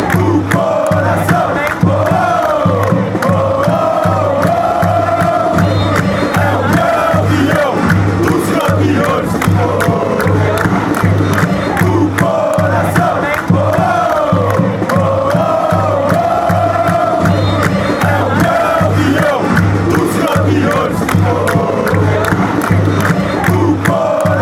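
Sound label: sao paulo chant, football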